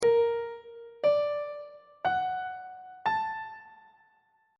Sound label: g harmonic minor 7th chord 3 is b flat augmented major 7th on treble clef